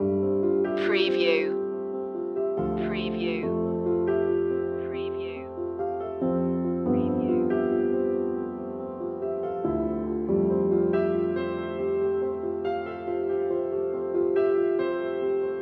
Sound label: drops in time loop